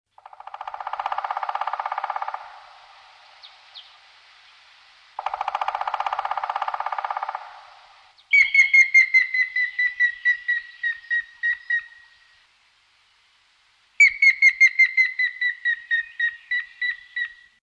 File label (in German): grauspecht